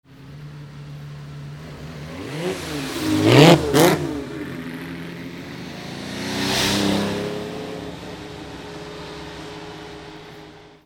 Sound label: two barchettas